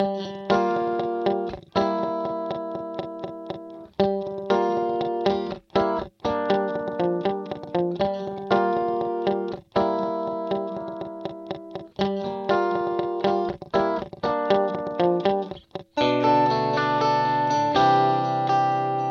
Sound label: Guitar Yamaha Rev7 Electric Bass A Vintage Digital